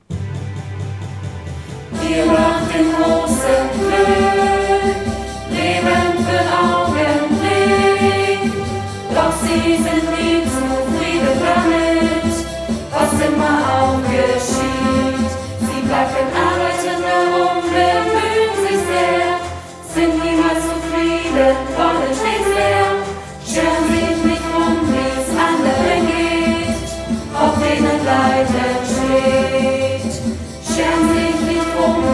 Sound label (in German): chor